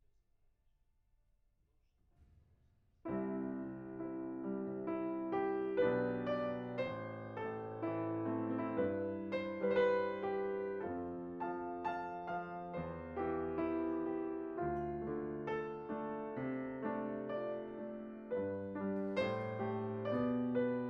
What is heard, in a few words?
PIANO